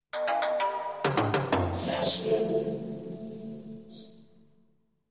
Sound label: Jingle